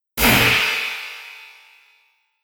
Metal Explosive Impact; Explosive Impact 04; Miscellaneous Impact Sound Effects; Andrew Fleming

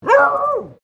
Animal Dog Beagle Female Bark Close; Various domestic dogs; Animals; SoundEffects-Foundation1

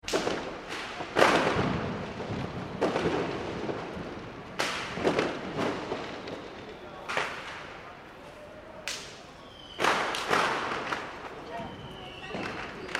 fireworks street urban; Fireworks ( Domestic ) Being Let Of In City / Town Centre; Chinese New Year (Sound Effects:Seasonal); McKinney Sound

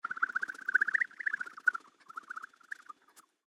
Firefly Fantasy Flying Around; Aliens; Big Room Sound

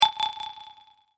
multimedia rollover, Multimedia Rollover Tone

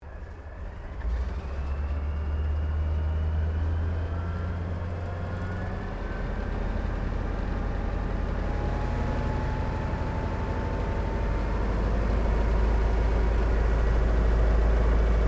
AIRCRAFT CAC CA-25 WINJEEL SYSTEM CHECK INTERIOR; RAAF Winjeel fighter trainer; Airplanes; Vehicles

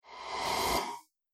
Subtle Vacuum Whoosh; Whoosh Sound Effects

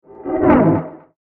Deep Space Fly By, whoosh

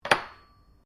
cutlery general place bench, Household cutlery

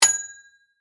Bell Short, Music, Bell, Short; Musical; BlastWave

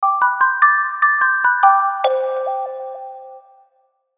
Logo Marimba Melody; Reverb Resonance; Sonic Logo; Blastwave

